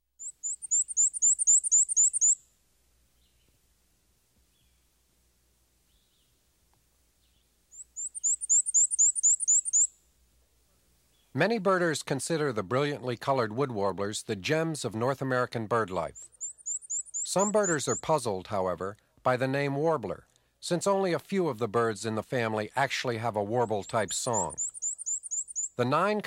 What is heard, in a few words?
Wood Warblers and a Wren, Warblers, Ovenbird, Common Yellowthroat, Northern Parula; American Redstart; Carolina Wren; Birding By Ear